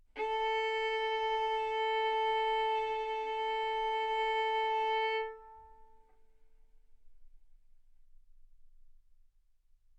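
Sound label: Violin arco mf A string A4 B4 stereo; sul A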